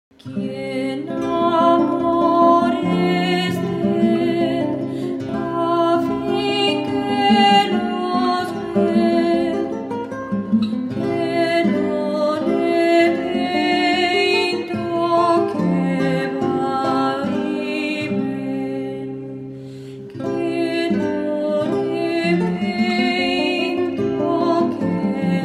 Guitar; Quien Amores Ten; L Milan

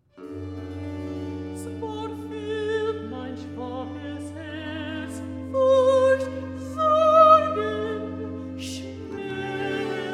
Recitative; Zwar Fuhlt Mein Schwaches Herz; Bach

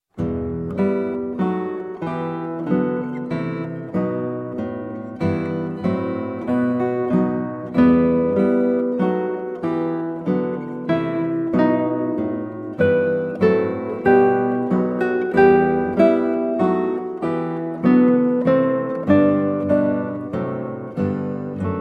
Adagio from Flute Sonata in G Major, Guitar; HWV 363b; Handel; George Frideric Handel

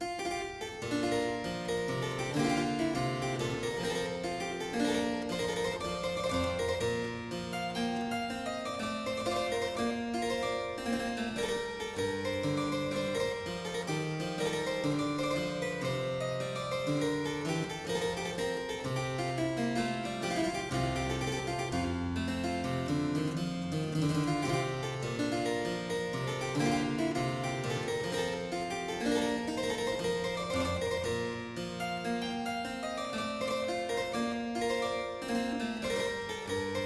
Suite in C major - Courante, Harpsichord; Jacques Champion de Chambonnieres

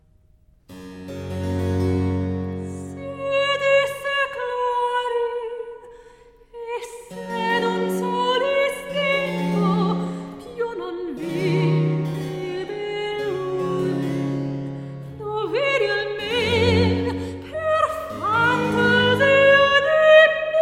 Cantata - Recitativo; Il Delirio Amoroso; George Frideric Handel

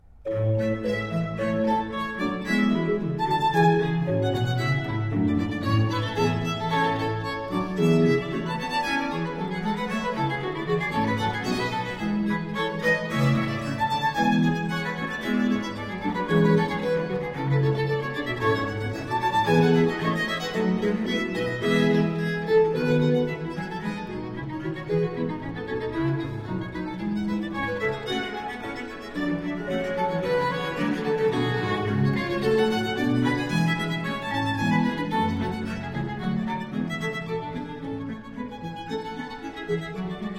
Sonata No. 2 in G minor allegro; Haendel; Sonate a trio

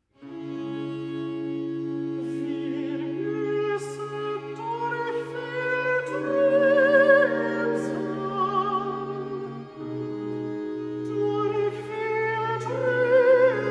Recitative; Weinen Klagen Sorgen Zagen BWV 12; Wir mussen durch viel Truebsal; Bach

